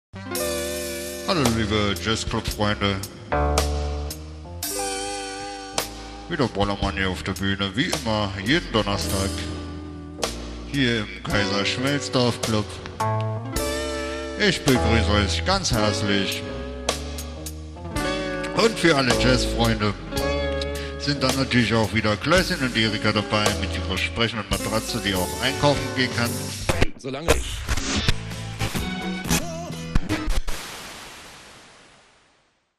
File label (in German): jazz stoerung